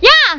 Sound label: Female Hya!; Realmz 4.0